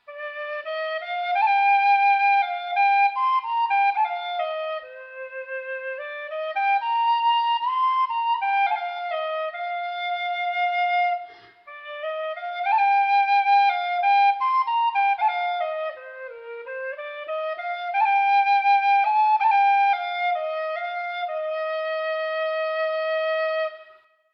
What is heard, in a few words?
oriole bb; susato